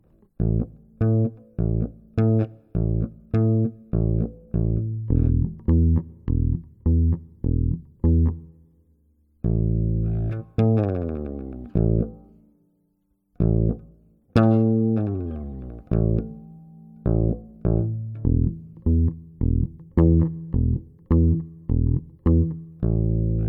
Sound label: electric bass